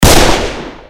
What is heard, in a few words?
Deagle